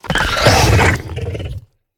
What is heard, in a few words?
Karkinos sound